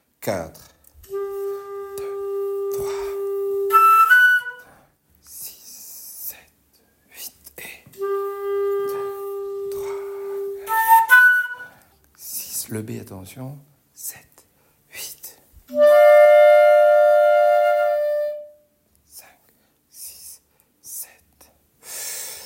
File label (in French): Bourrée dernier tour une quinte au-dessus